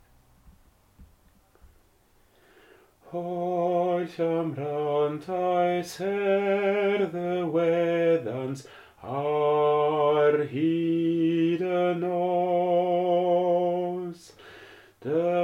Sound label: soprano